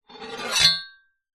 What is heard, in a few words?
sword slow scrape on metal or other sword